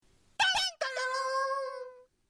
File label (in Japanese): 缶けり